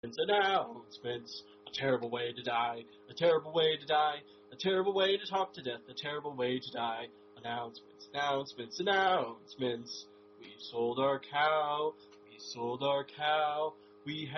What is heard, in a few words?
announcement songs; The Announcements Song; We Sold The Cow; Boy Scout Announcement Songs